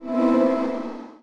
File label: connection lost; Nokia; 770 Internet Tablet